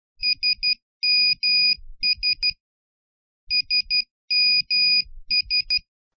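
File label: nokia special, ringtone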